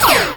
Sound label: Player Laser; Redmatch 2